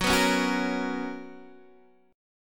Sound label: B Major 7th Suspended 2nd, chord